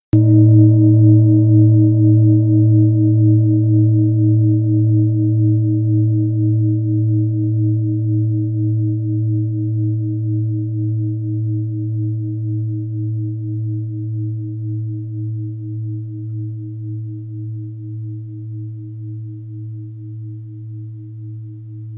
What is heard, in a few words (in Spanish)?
cuenco, cuenco tibetano frecuencia tercer chakra; cuenco aura